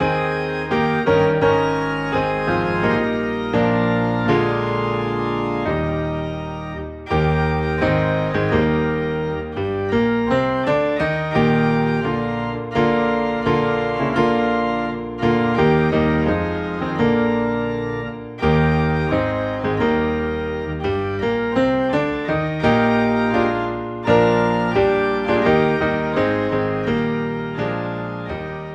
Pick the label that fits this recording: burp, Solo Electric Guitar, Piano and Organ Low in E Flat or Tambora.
Piano and Organ Low in E Flat